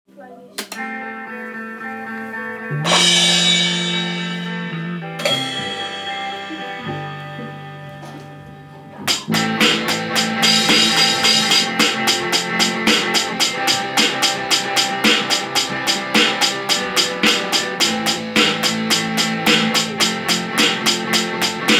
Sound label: on the drums